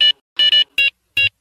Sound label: textone